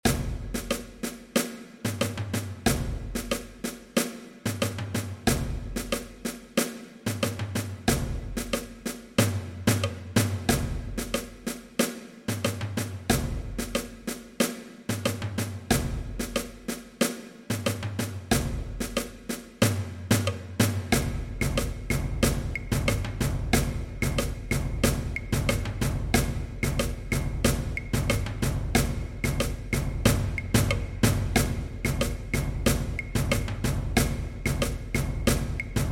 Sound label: percussion